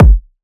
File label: kick hype; Drum Sample Pack; One Shots; Slate; SEISMIC